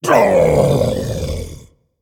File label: Male Demon Death